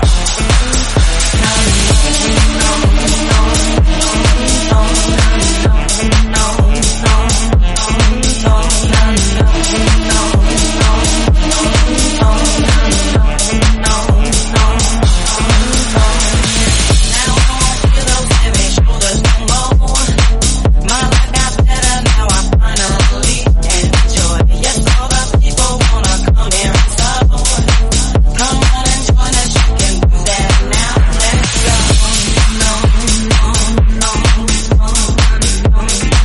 Tech House, 128bpm

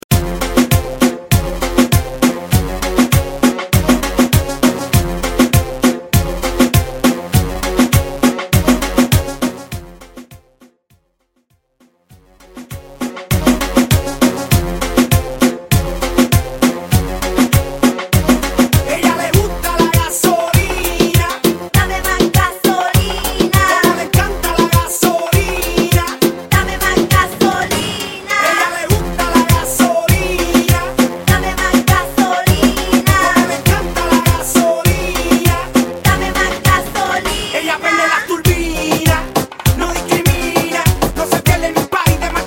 Moombahton; Mainstream VIP; Bootleg

